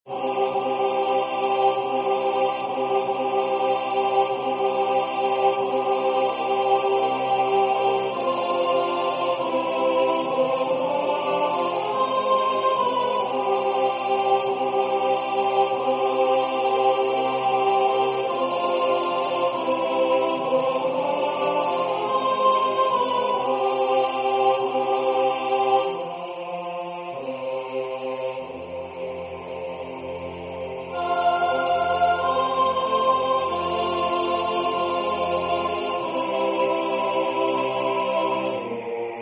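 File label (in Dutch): soprano, koor; Lokkeleg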